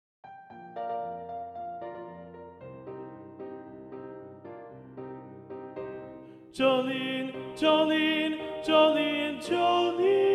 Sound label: Soprano; Practice Room